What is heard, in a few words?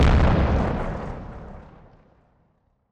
Sci-Fi Explosion; SoundsCrate